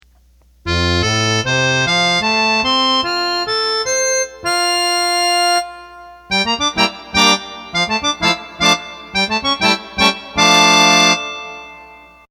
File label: Accordion